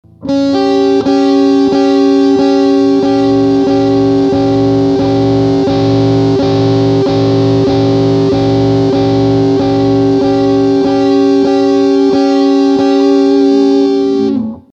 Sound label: Tritone, guitar sounds